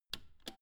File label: old button half pressed